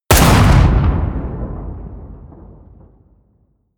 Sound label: blast, hits explosionen metall